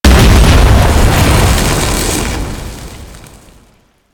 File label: APC Explosion; Enemies; Lasrian; Warframe